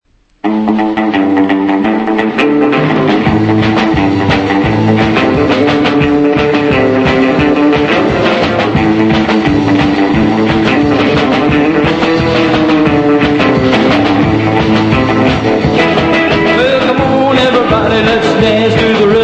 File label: rhythm rock